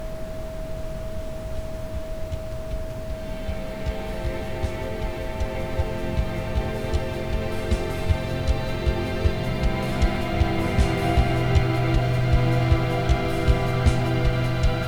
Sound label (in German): Ambient Sound; chakren; Anahata Glueckseligkeit